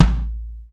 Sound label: KIK, Real Kiks; Club-50 - Foundations Roland